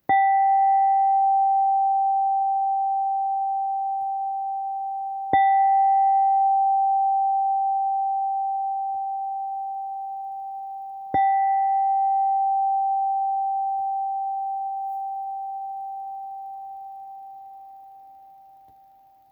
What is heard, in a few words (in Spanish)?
sol 780 hz, cuencos tibetanos; Verde